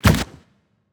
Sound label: spike Launch; Thalamus; CARRIER